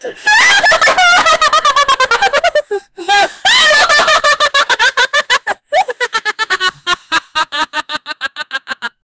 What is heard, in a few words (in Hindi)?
dande se marna, strike